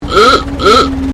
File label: Truck Chevrolet 1926 30cwt Horn; Classic comercial vehicles